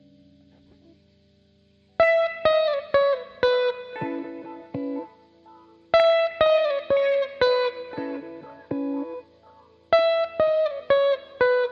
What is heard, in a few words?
windy urgency